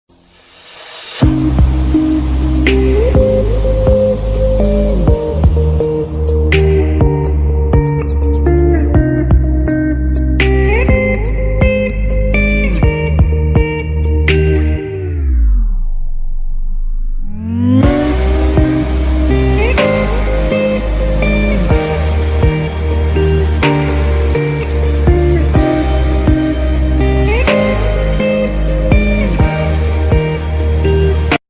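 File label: background music ringtone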